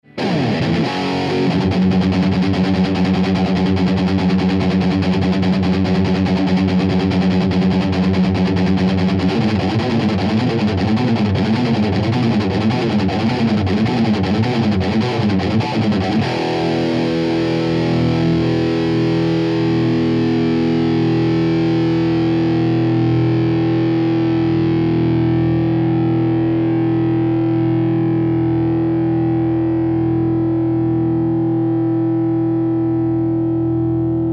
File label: tsl lead